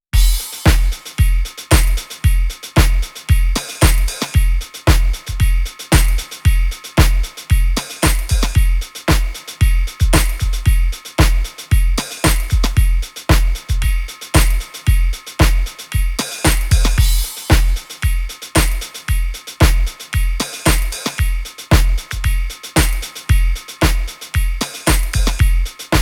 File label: Good Drum Tail Reverb